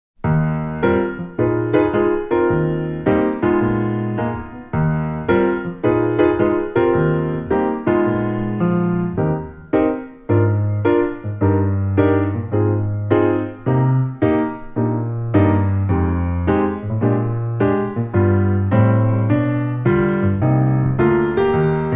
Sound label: Ragtime, Piano Only